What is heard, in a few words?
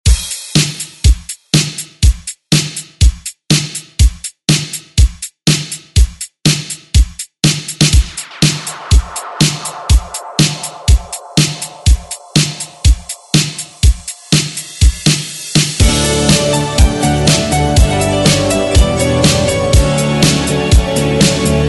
ReDrum; DJ Mhark